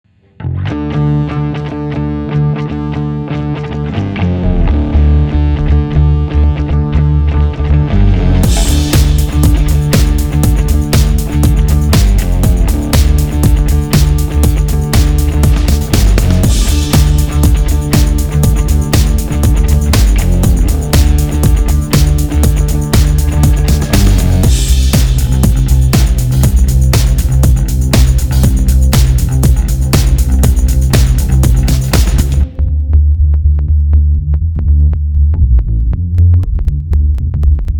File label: Hot Rod alt mix big beat; Lifestyle TV & Commercial